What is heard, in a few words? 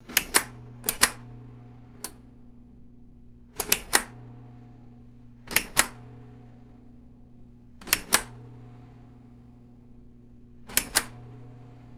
heavy plastic switch toggled on and off; mechanical